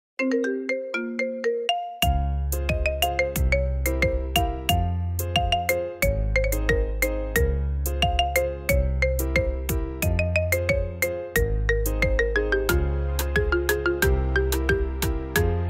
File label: Marimba Remix